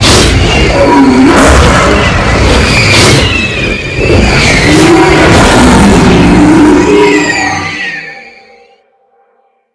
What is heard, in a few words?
wolf follow enemy; fade